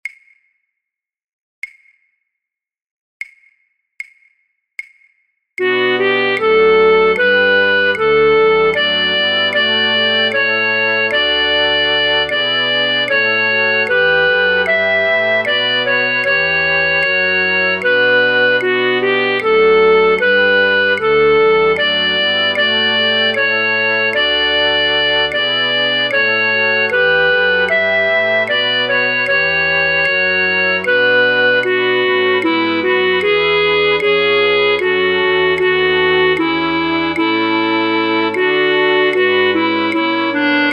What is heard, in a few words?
flute choir, Fluit 3A1, Fluit 3A2, Fluit 3B1; Matthaus